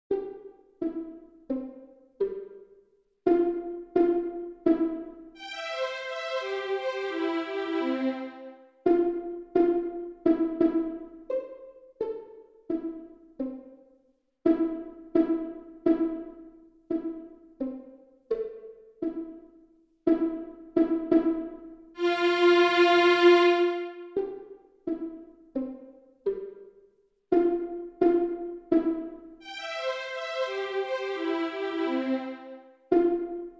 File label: Pony Trot Vln